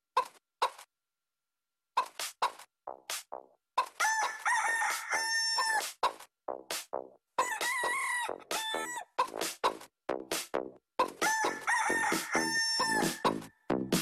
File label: Techno Chicken